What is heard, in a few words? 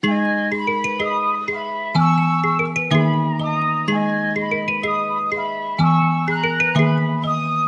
Melody Loop - 125 BPM G# Min; Cymatics; Oracle Sample Pack